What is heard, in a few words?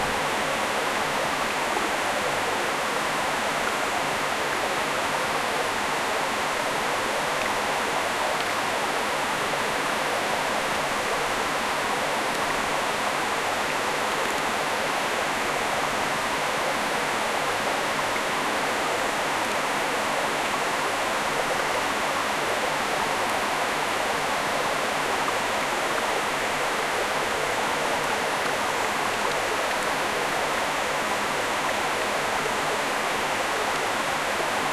gently flowing river; calm